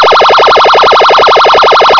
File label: ZAPPER